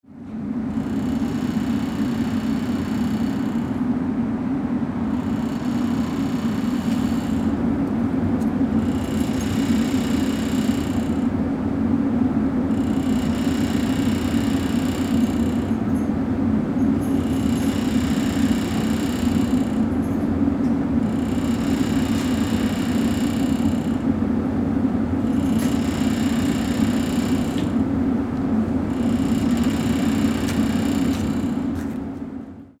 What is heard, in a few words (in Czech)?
tramvaj spici